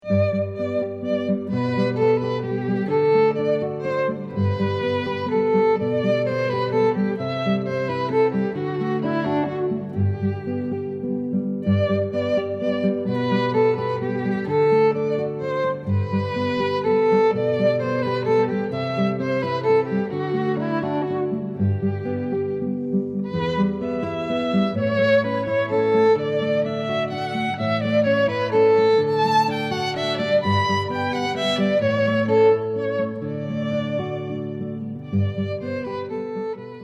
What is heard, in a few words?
Menuet, violin and guitar duet